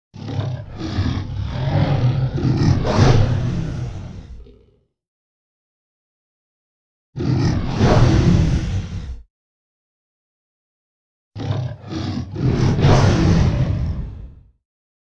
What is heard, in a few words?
Dragon Roars; Sound Ideas; Series 2000